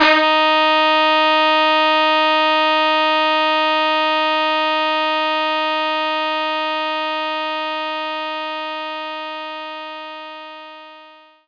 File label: brassmix